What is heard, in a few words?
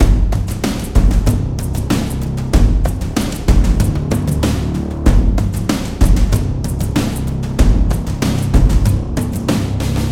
sounds music; Halo Combat Evolved; Xbox